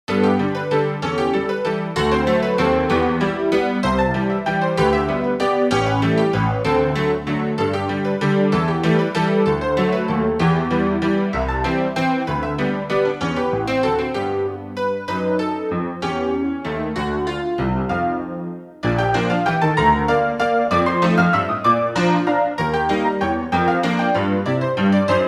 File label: player piano